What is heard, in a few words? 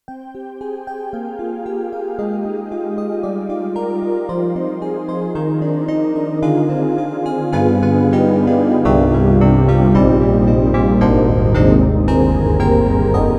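YAMAHA MU1000EX DX Analog, piano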